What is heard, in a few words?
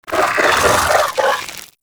Vocal Idle; Warframe; Moa Satyr